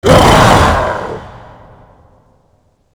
shout; glasgavelen

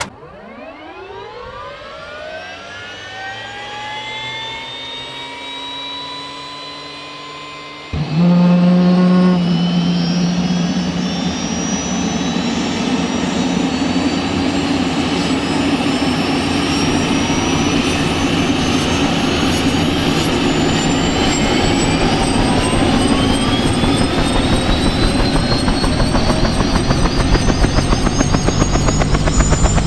h6 start, heli; wac

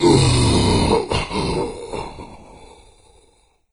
death; doom3; commando

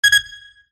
security bot beep; gow; fortifications

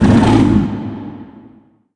pain; cyberdemon

